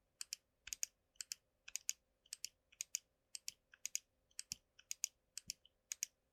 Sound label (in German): Seitentasten